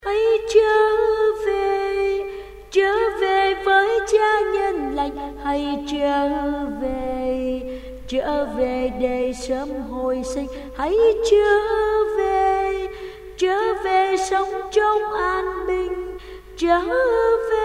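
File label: soprano alto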